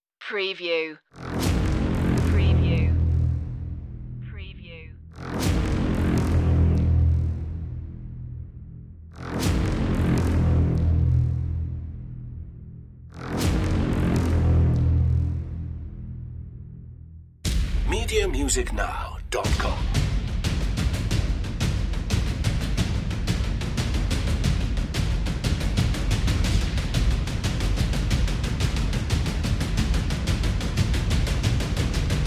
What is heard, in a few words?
hybrid trailer drums and hits only